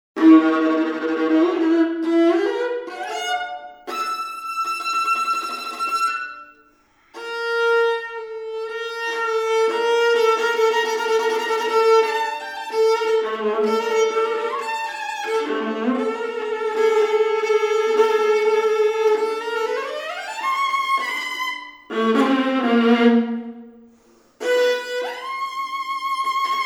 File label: Lament for solo viola